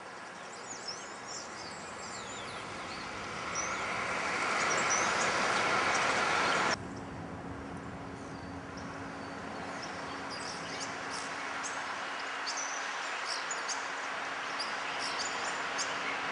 hawk RT

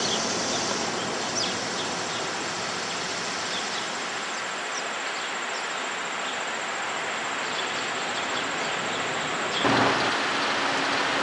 Ruby-crowned-Kinglet

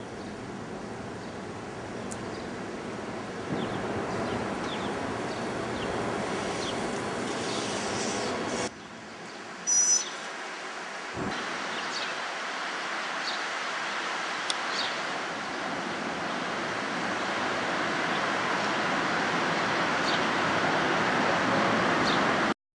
mockingbird baby

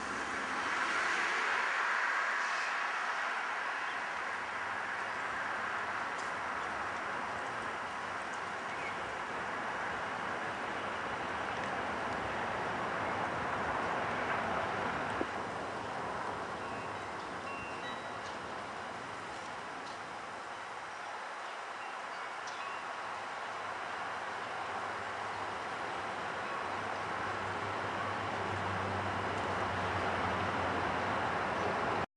Coopers Hawk

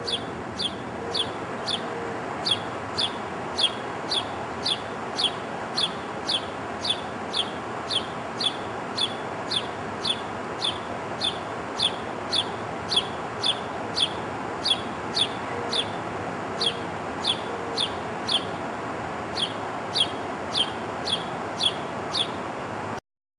house sparrow

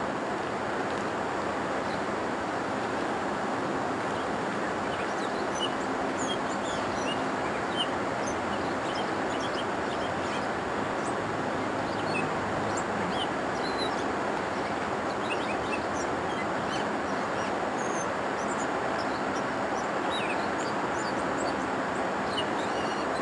catbird sings

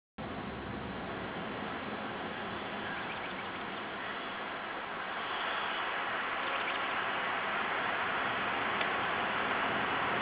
Birds Kinglet, morning

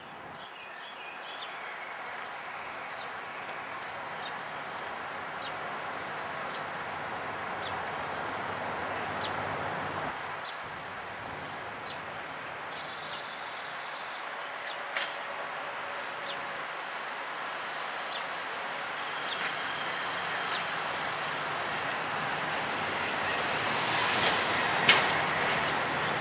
Yellow shafted flicker

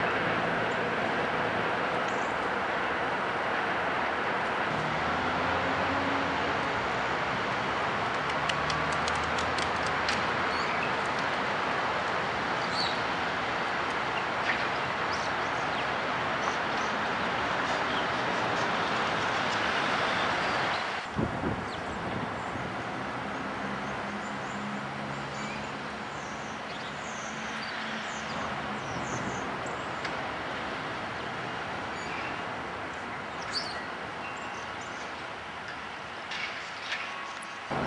Cardinals; MLK

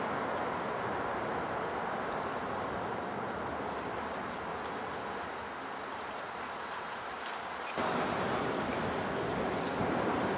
Purple Finch

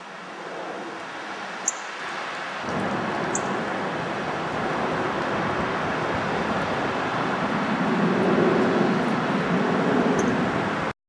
Yellowthroat

